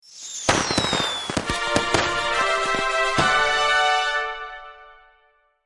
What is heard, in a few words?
win